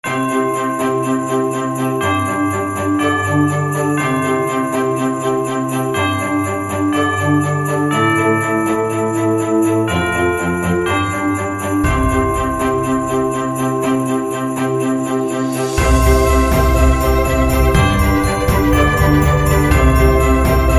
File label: Upbeat Xmas, happy music